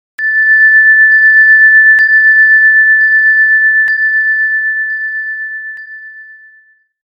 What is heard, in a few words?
chimes